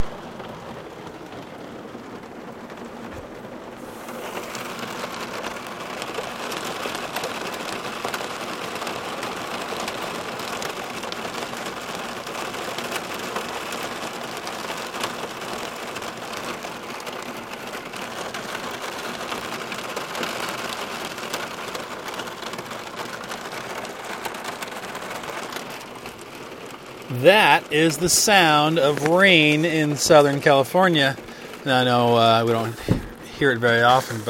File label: rain in southern california